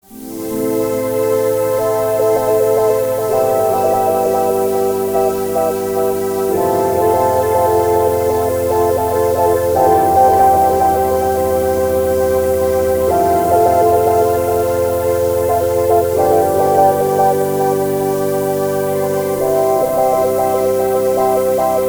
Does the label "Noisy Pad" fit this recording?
Yes